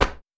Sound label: Cement